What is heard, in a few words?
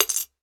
money piggy bank coin insert; sound effects one